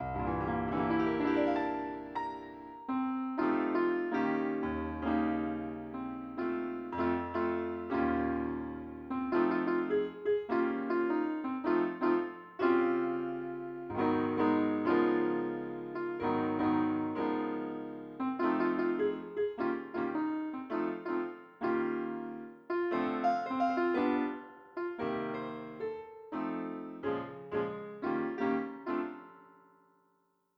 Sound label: piano